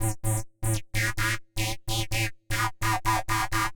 128 A, uk garage, Synths; Targa; 128bpm Lines n Loops

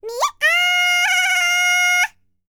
BLACK SEA, World Voices; ILIO; Vocal Planet VOL-5

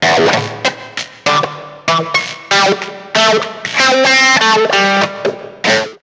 GTR

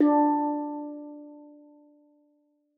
steeldrum D3; Xsample VOL-4

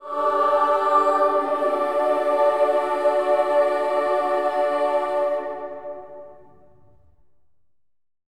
amen; Spectrasonics; Symphony of Voices; BC AMENS

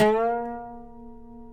PLK OUD, Roland LA Composer; Roland - LA Composer vol. 3

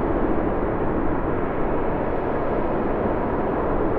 Sample n Hold Verb Loops, sparse soundscape

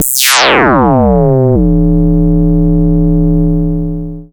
FX SWEEP, SYNTHSWEEPS

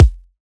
kick F, hip-hop drum kit; 99Sounds x Monosounds; Moon

